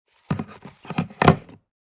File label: Headphones set down; headphones and speakers